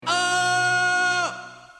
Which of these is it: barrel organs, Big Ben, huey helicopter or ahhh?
ahhh